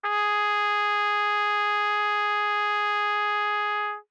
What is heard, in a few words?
Trumpet novib ff Ab4 stereo, Bb Trumpet; MIS Pitches - 2014; Brass